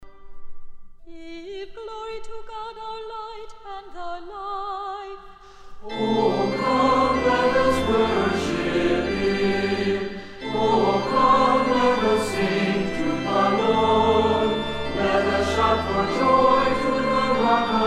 Morning Prayer Oh come let us sing to the Lord Psalmody